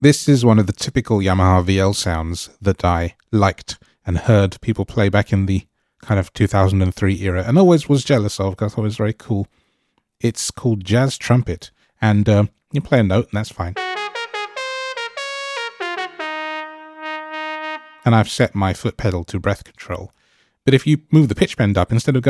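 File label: Jazz Trumpet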